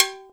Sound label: COWBELL, Percussion; InVision Interactive; Percussion Slam; SULTAN